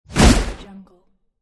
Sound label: boom